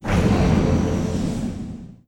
click land all; Stellaris

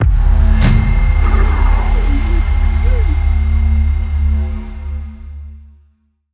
hand shake; Stellaris; nemesis

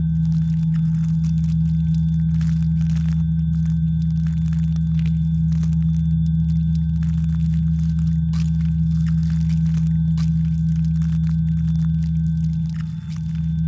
pro ship science idle; biogenesis

